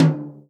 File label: TOM, Real Drum Kit